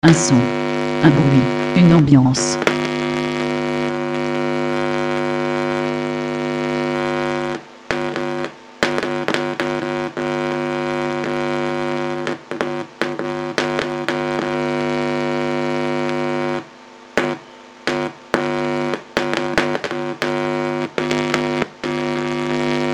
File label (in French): Un son un bruit une ambiance; Les oreilles du monde